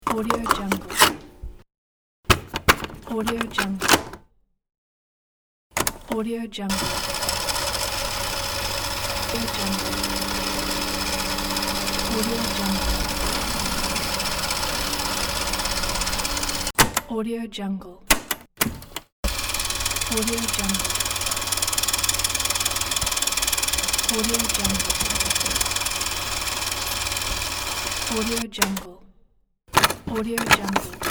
tape deck and cassette sounds

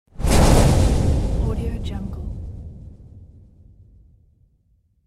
impact

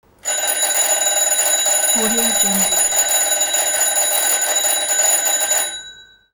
school bell

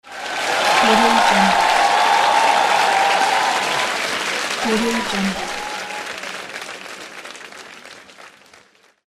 cheering applause

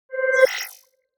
ui scifi bleep

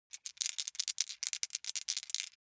foley dice small three rattle in hand; smartsoundfx

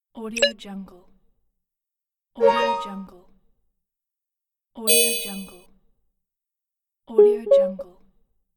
game event notifiers; audioefx

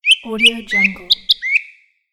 bird

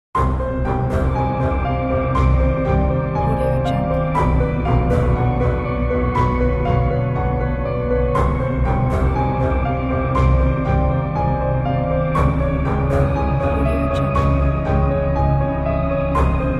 tense horror music

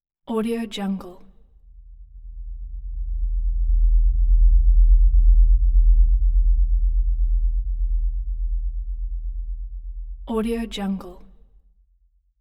deep earthquake rumble

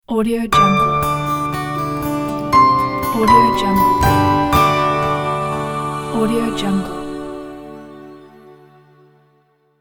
guitar logo

